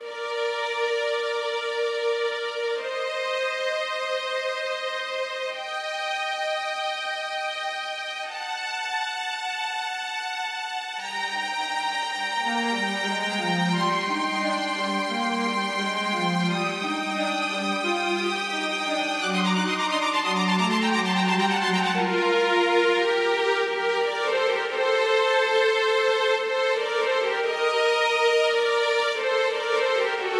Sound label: Boss Layer2; project aether first contact macos switch windows gamerip 2020